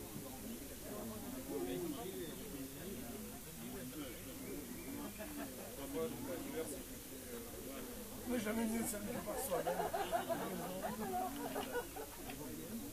rayol farm; SEAMED

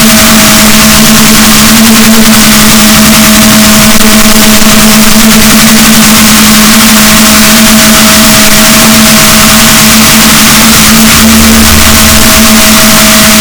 rorquals, Balaenoptera Physalus, boat; Chile; SNR -6dB

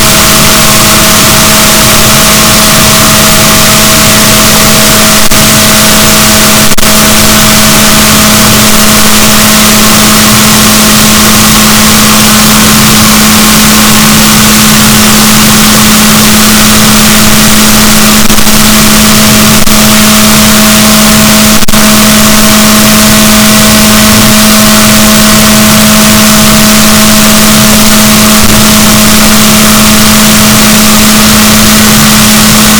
rorquals negatif, boat

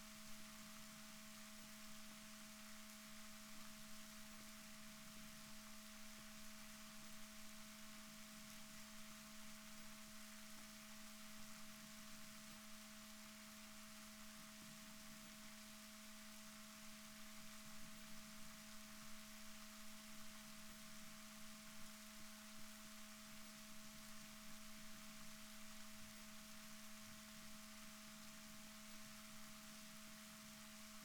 rorquals positif, Balaenoptera Physalus Chile

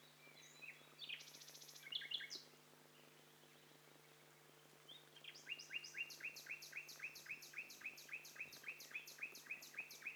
STEREO BIRD COCKTAIL PARTY

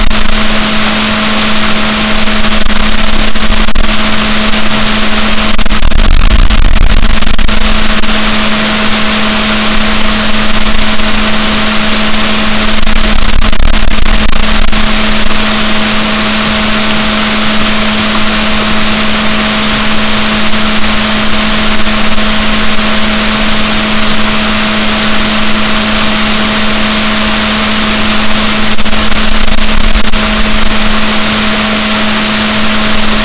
rorquals negatif, gaussian noise; Balaenoptera Physalus; SNR 6dB

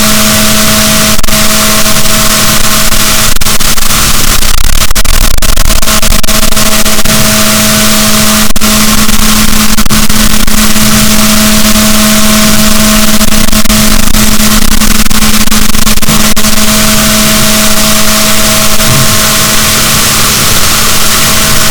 rorquals positif, boat, BalaenopteraPhysalus; Balaenoptera Physalus Chile

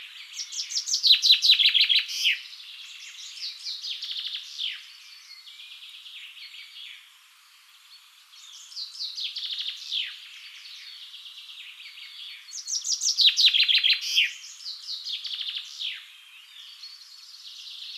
Fringilla coelebs; SETE

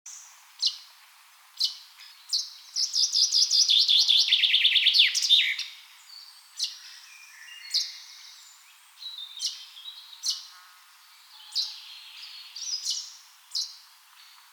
Fringilla coelebs; SETE

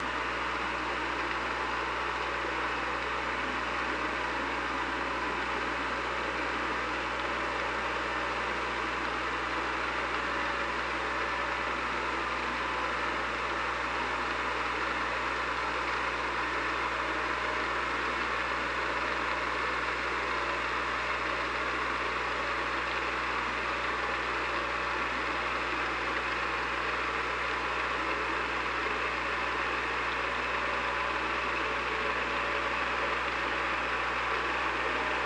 OrcaLab

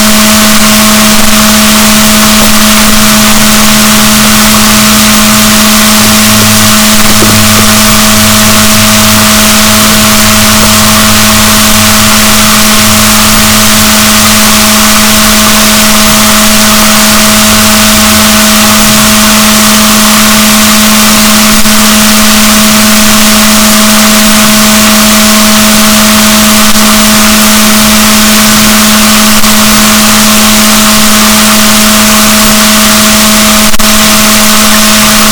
rorquals negatif, boat

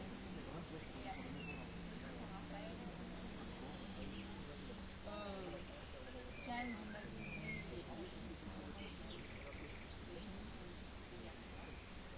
rayol farm; SEAMED

